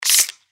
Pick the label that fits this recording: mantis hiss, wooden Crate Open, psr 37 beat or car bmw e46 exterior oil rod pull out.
mantis hiss